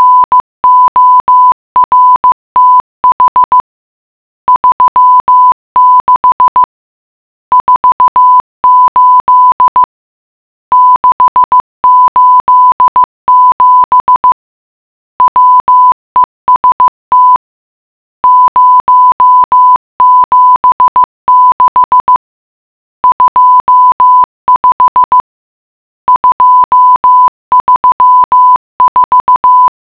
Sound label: morse code